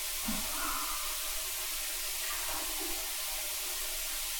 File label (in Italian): Respiro; c è qualcuno; Bogoni